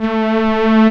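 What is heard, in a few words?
SYN STEREO, dance synth; Sound & Vision - Gigapack I CD 2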